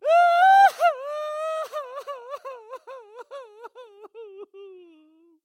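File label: sounds of male crying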